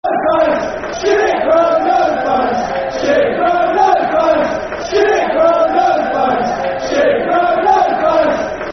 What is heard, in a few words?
Shit Ground No Fans, Football Chant; Soccer Songs; Cheltenham Town Ctfc